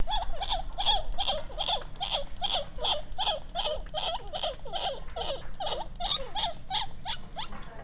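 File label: Fukomys mechowii adult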